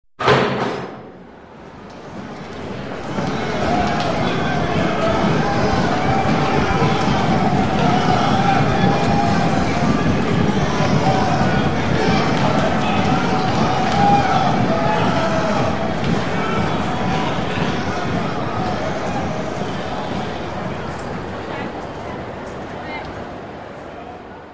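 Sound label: Players Push Bar Door; arena foot stomps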